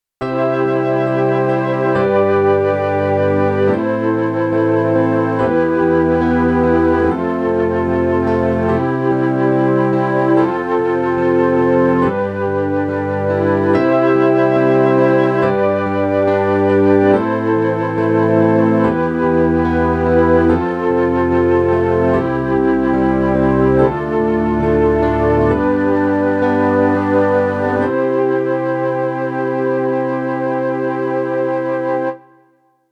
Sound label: Nord Grand silver flutes